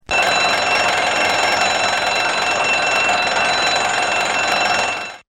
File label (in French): ALARME; Stereo